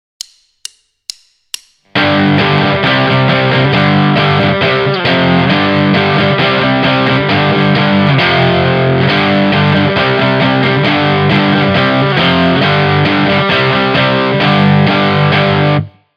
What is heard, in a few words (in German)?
Greifhand Wechselschlag, Rhythm Guitar; Basic Rhythm Guitar II - "rock it!"